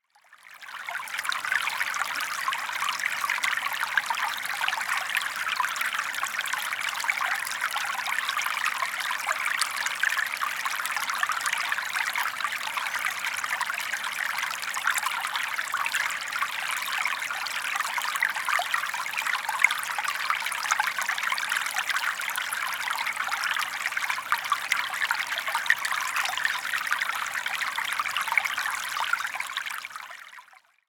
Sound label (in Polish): odglosy natury Szmer strumienia; Odgłosy natury